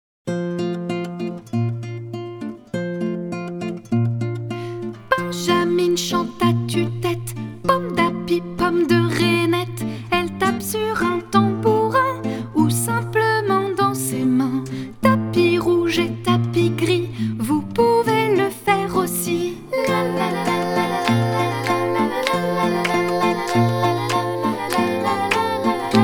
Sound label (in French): comptine